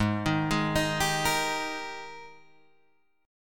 G sharp Major 7th Suspended 2nd, Guitar, Drop D

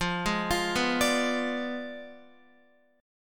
F Diminished 7th, Guitar; arpeggiated

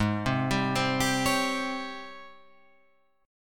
G sharp Major 11th G sharp, Guitar Open F; 8,7,7,7,10,8; JGuitar

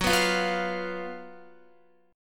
Gb Major 7th Suspended 4th Gb Guitar; GbM7sus4; strummed; JGuitar

